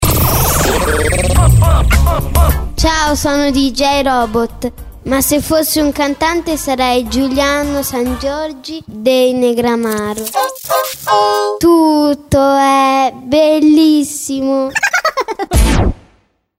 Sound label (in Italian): ROBOT CANTANTE